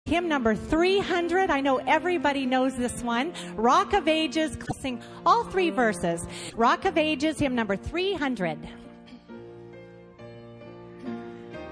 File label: Rock of Ages, Choir Hymns; ALL 3 Stanzas; SDA Hymns; Sabbath School